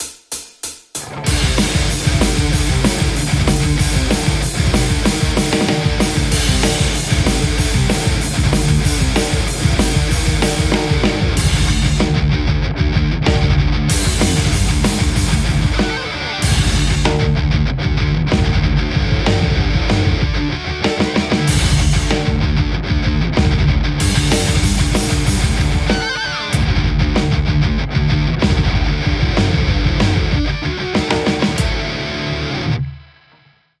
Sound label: metal Les Paul; overloud th2 fullmetal